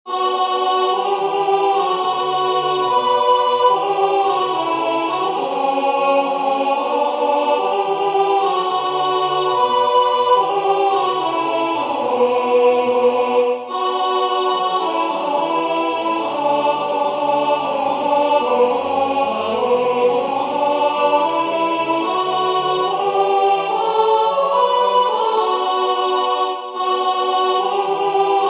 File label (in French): Le Christ est ne ce soir alto